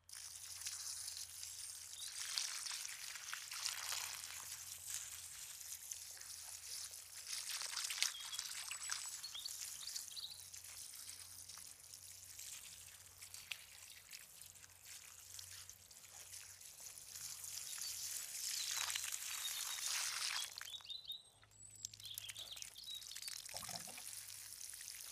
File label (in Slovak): polievanie